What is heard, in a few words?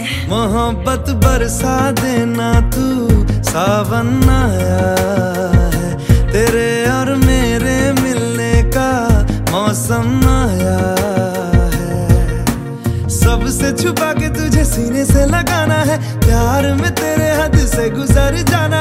New Romantic Hindi Song Ringtone